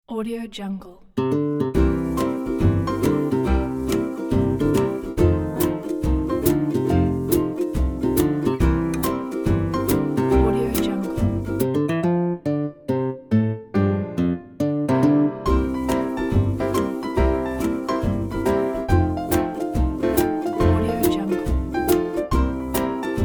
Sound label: comedy jazz